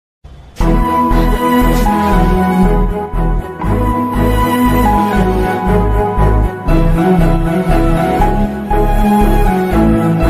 alone bgm; adithya varma

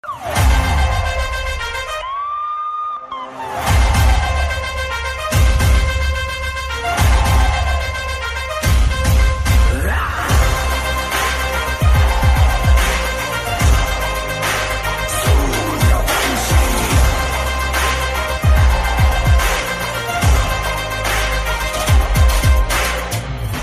police entry theme, mass bgm; Sooryavanshi

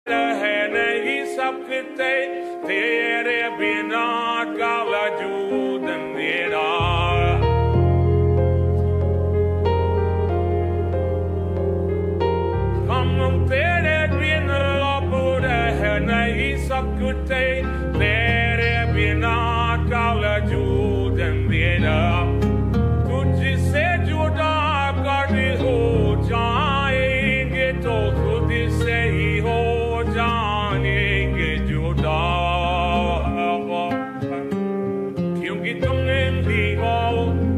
ai modi song hindi song; aashiqui 2

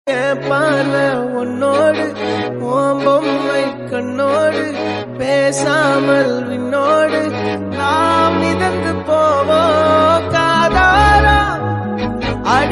tamil bgm ringtone; siddharth chithha; tamil latest bgm

